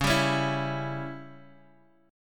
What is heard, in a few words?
C sharp Diminished C sharp down Guitar Half Step Down; C#dim